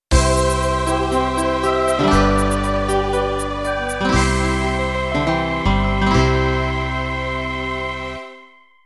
Schlager End

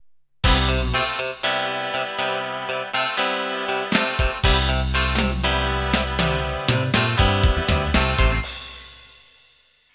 Pop Rock Intro

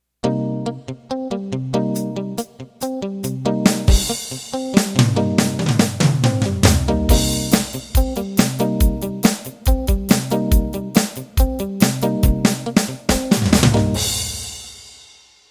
Country Boogie Intro

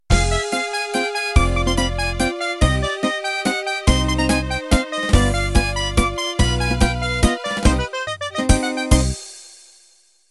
Waltz Italiano End

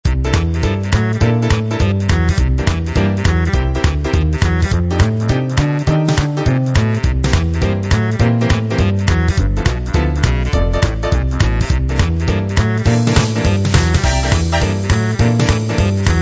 boogie

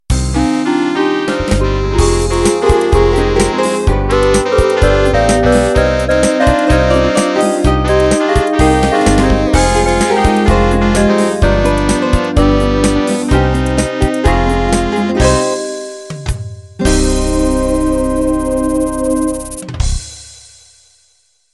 Beguine End

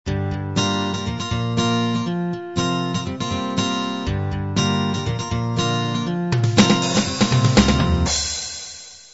uk pop intro

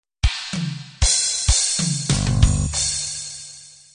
guitar ballad intro

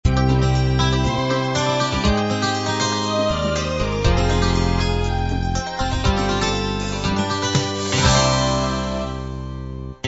uk rb end

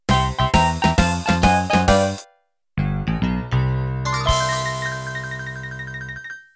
Country Shuffle End

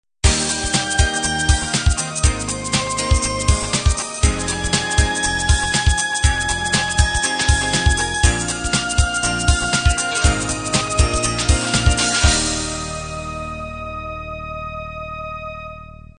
trendy beat end

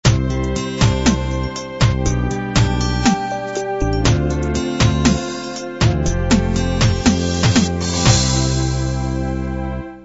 16 beat ballad end

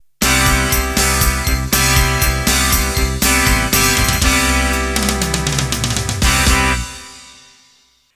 Heavy Rock End

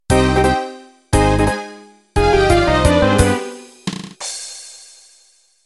Viennese Waltz Intro

